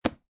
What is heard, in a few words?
Poker Chip Dropping On Felt; Poker, gambling; Cards; Casinos; LynneMusic